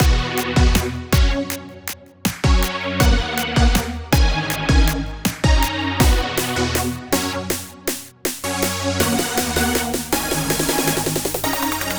Backing

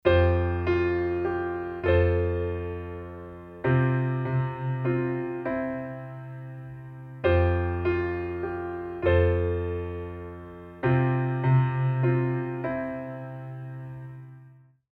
Fsus2 F Csus4 C

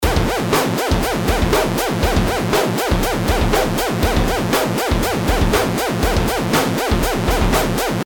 lfo

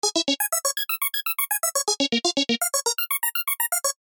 arp

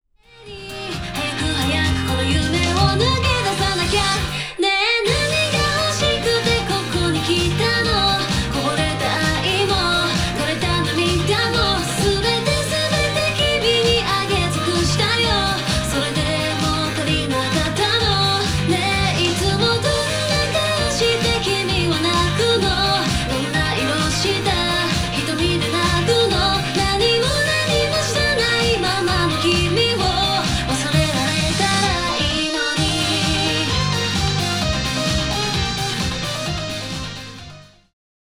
J-POP

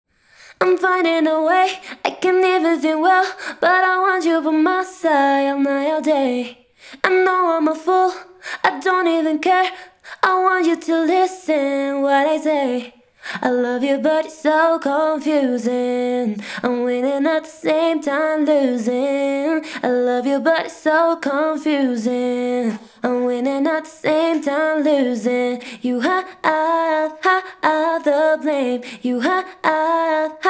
vocal de-noise

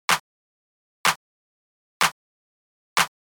Clap

